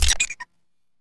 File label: ui close; orbit